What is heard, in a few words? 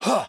Attack Small